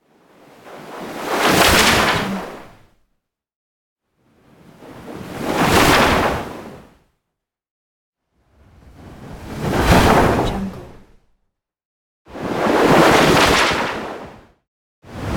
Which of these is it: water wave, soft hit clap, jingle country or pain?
water wave